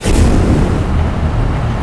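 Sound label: mine catwalk start; doors elevators; Star Wars Obi-Wan; XBOX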